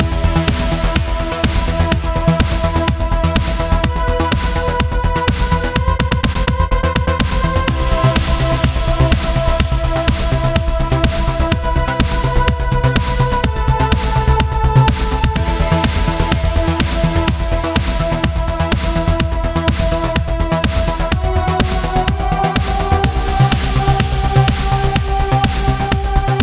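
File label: Fight 4x4 D major; Challenger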